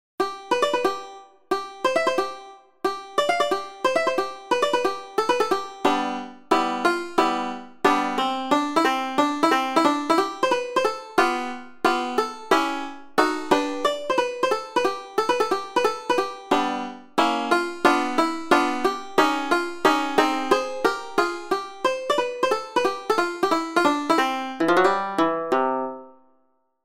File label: Banjoviality